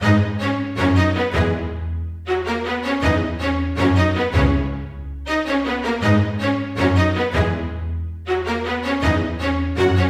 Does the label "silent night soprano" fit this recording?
No